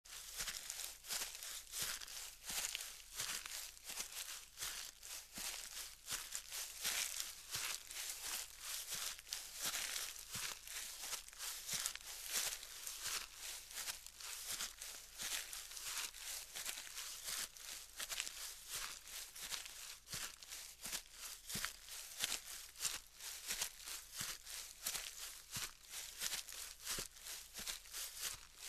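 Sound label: sound of footsteps on leaves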